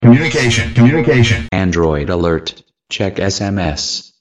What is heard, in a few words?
Android Alert